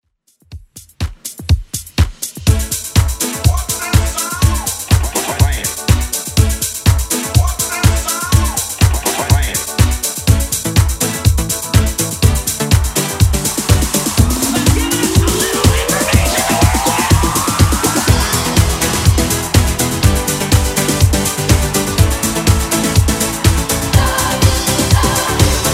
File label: 90s Redrum 123bpm